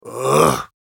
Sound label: "Ahh", samurai voice; samurai voice selection 2